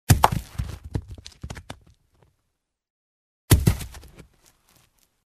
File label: stone falls to the ground